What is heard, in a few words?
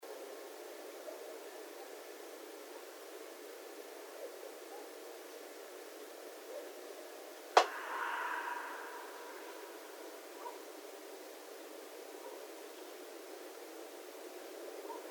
Red Fox